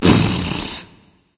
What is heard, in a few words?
tongue berserker; cuchulainn